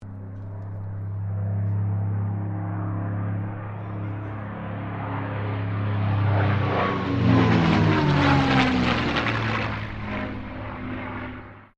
AIRCRAFT CAC CA-18 MUSTANG MK23 PASS STEREO, WW2 Mustang fighter plane; Aircraft Cac Ca-18 Mustang Mk23 1950; Airplanes (Sound Effects:Vehicles)